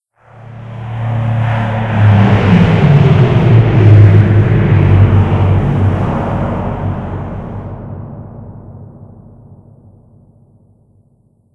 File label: flyby